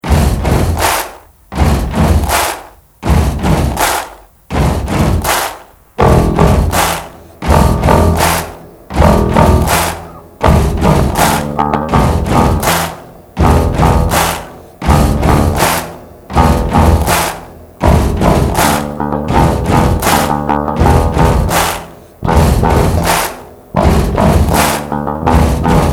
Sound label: bass